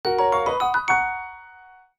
Fanfare Small Item; "Get Small Item" fanfare; BOTW; Breath of the Wild

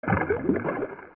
Octorok Sink; OOT

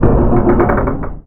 Guardian Creak

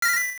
Funny Joke; Poses from performing the Funny Joke; Zelda Oracle

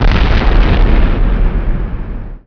Explosion

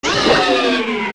Die; Dodongo; zelda; OOT

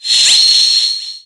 Reappear; Zelda teleporting - Farore's Wind

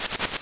owl fly in; Zelda

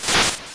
eagle flap; Evil Eagle; Zelda

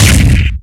Hit Kick

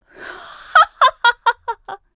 Welcome Laugh; Fanadi (fortune teller) welcoming Link with a laugh

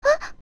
Young Light Gasp; Zelda

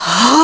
Final Smash Pull; Sheik's Final Smash: Pulling back the Light Arrow (uses the same sound effect as Zelda)

